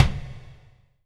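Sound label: MEDICINE CAB, PERCUSSIVE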